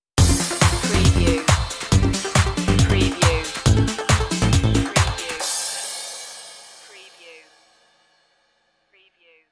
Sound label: dance stinger